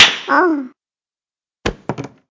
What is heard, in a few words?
Knockout; Talking Tom Cat 2